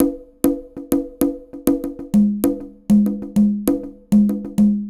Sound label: Drum perc